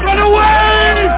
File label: repulse; Lords of Conquest v1.0